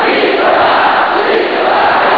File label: victoire loop